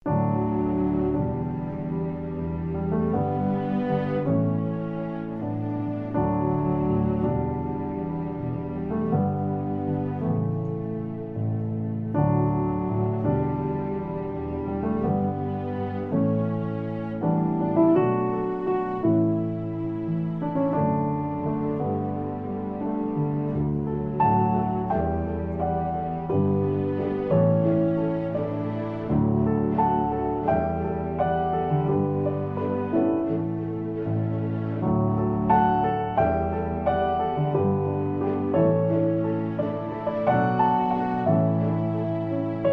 With Strings

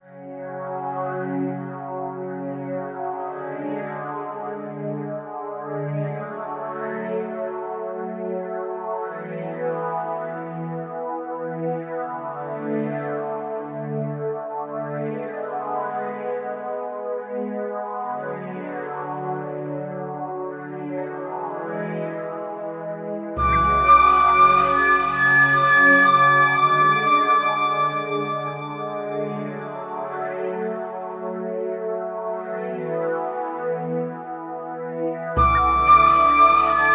Buddha Trance

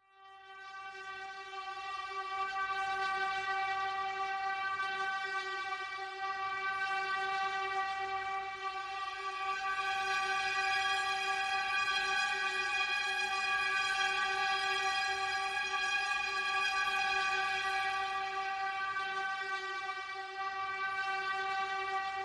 Strings Only

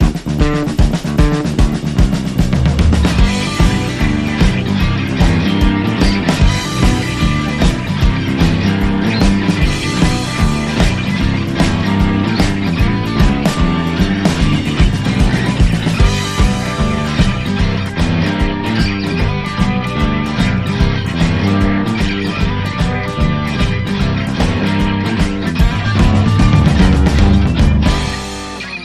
Mid West Jam, No Harmonica

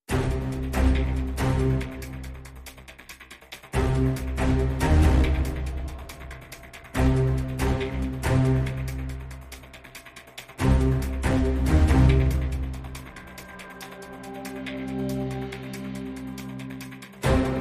Full With Small Perc

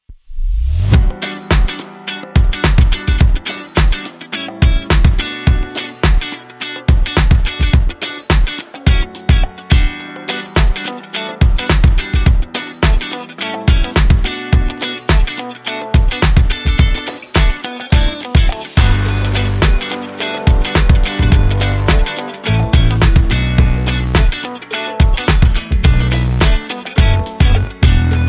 No Melody and Less Synths

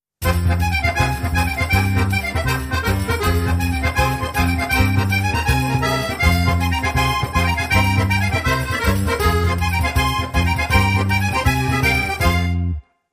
Jingle

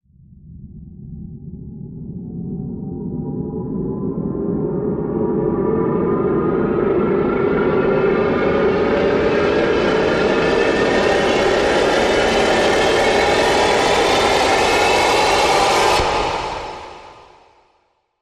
Interstellar Lift Off

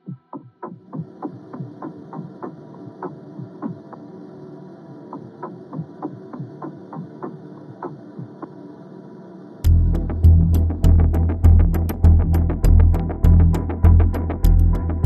No Piano

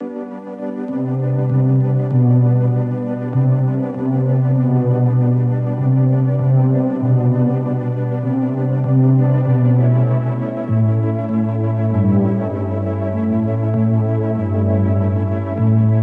Pad Synth

